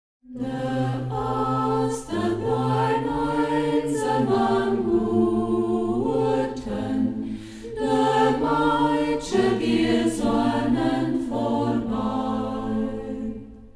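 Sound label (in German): Gitarrenkränzchen; Ortsmundart: Zeiden; Zeidner Gitarrenkränzchen; Hegt wird gesangen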